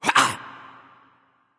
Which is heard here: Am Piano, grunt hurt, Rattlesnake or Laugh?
grunt hurt